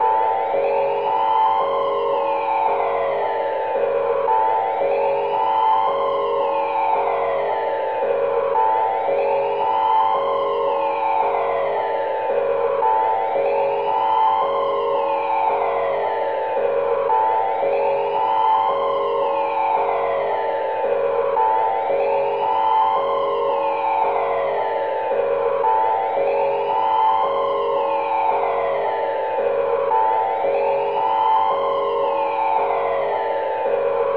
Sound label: Siren